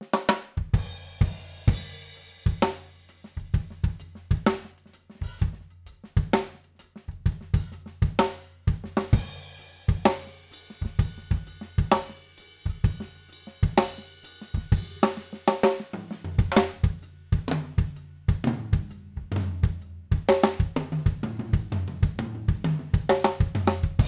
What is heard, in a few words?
OH Spaced Card, overheads, drum; drum shootout